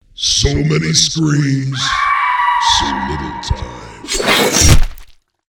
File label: scary goodbye (so many screams); Halloween Nightmare CD Sound Effects